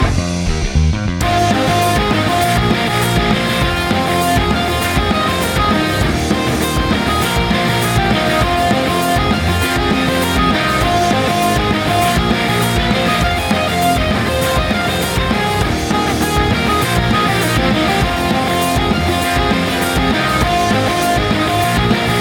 chorus

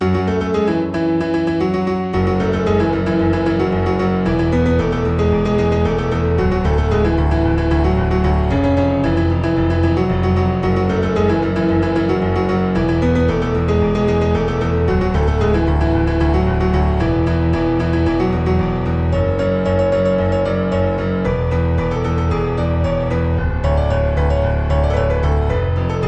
piano arrangement; jjba diamond is unbreakable

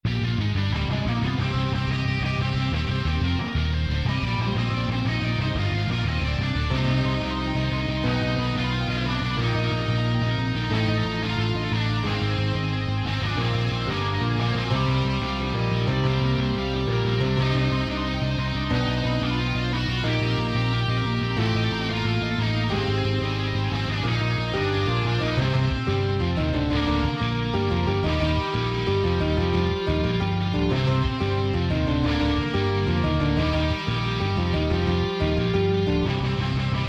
chorus all no drums

